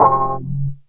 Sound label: dark guitar filtered